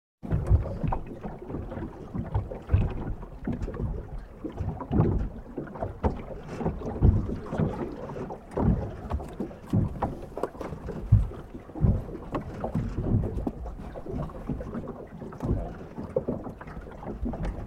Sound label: Bow Wash Interior Perspective, Boats; Sports